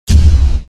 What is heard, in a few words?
Ship Weapon Spinal Projectile